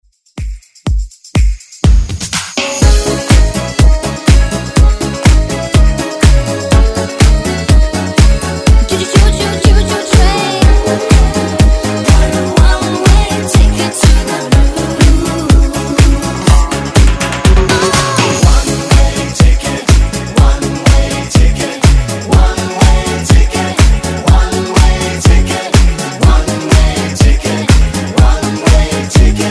Dance Redrum

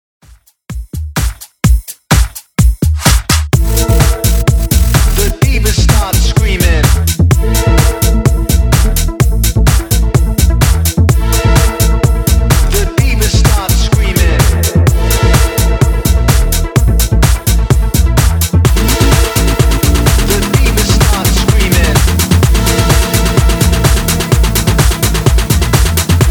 Club House Hype 127bpm

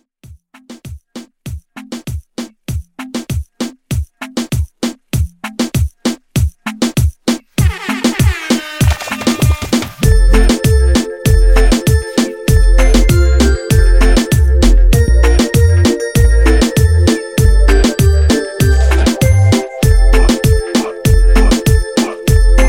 Jingle Xmas Bells 98

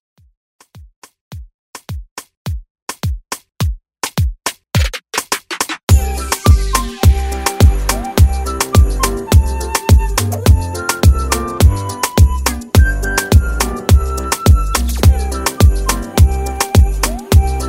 Xmas Moombah

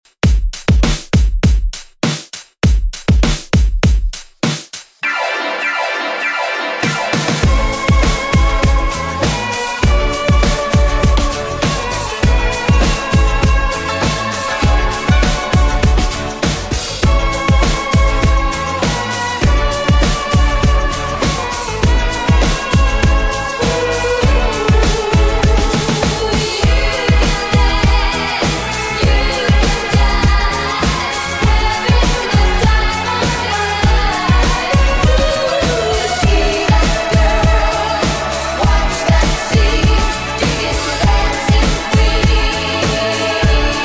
Classic Re Drum 100 bpm

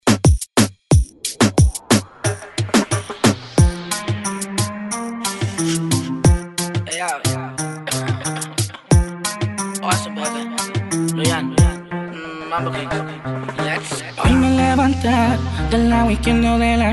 Reggaeton 90Bpm

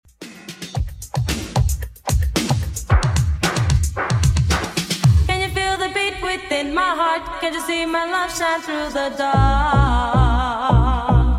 80s Dancemix Redrum 112bpm

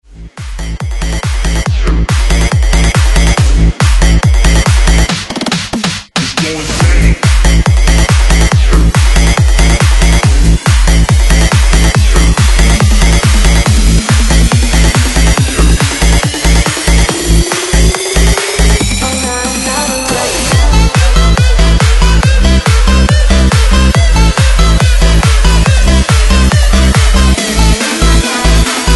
Vina Bounce 140Bpm

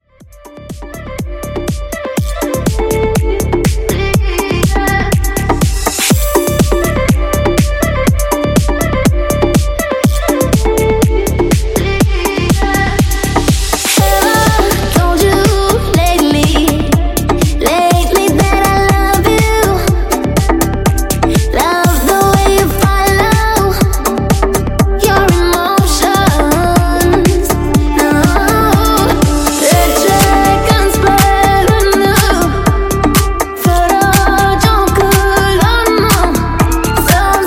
Club House 122 Bpm

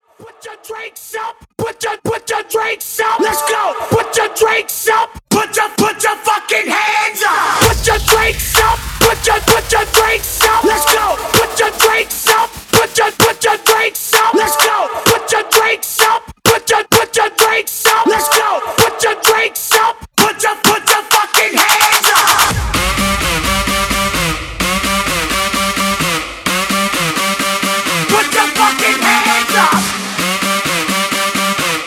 Hype Acapella Tool Mashup 129 BPM

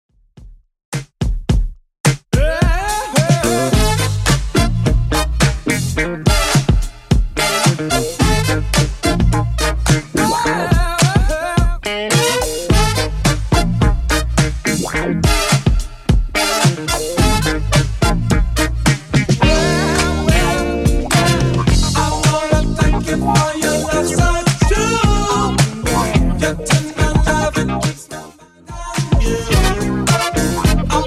70s Redrum 107bpm

